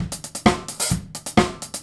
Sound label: Straight Kit, World Pop Beats; Straight Kits; Sonic Foundry (Sony Creative Software)